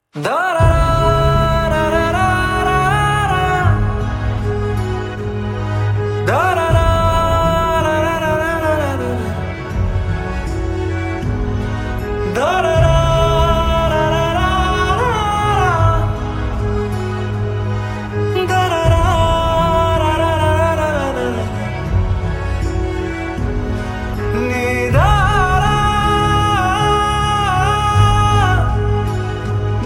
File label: emotional; jailer